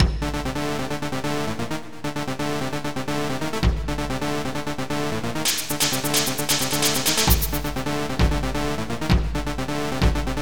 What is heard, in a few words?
Techno; Metaltech Battledrome